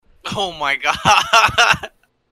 haha

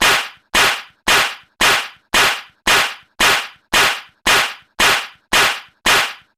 slaps

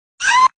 screamer

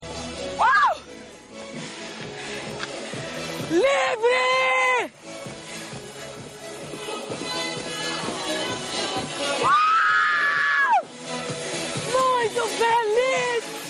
aaaaaaah

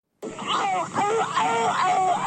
eugheuhghghgh

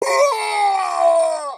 k o scream; mk9 kabal

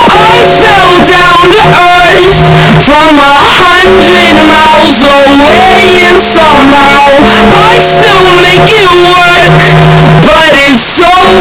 bass boosted

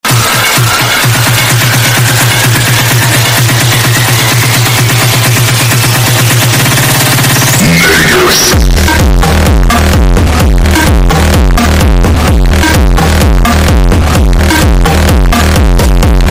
bass drop

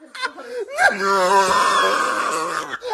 mosquito laugh

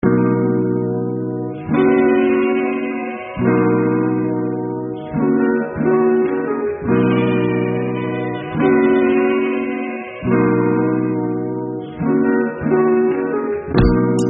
music

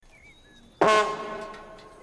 trombone solo; american pie 2

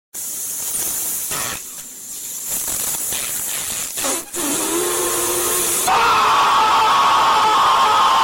fuuuuuu; rage guy

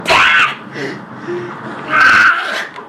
monkey screech

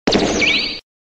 power off; super saiyan; dbz

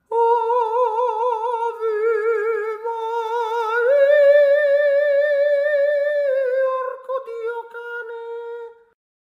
ave mariiiiiia

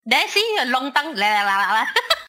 long tongue lelelel

